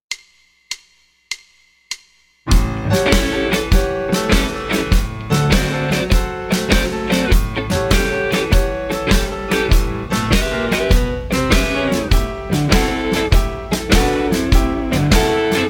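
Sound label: 12 Bar Texas Shuffle A 100, bass practice tracks; 12 Bar Blues Shuffle A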